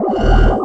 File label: powerup loop sound; lego star wars